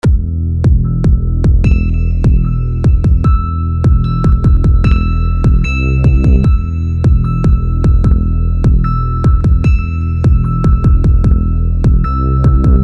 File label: distortion